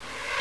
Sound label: Windoid open; Stanley's Sticker Stories (1997)(Edmark)